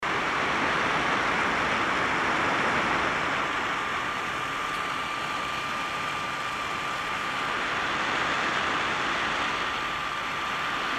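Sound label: noise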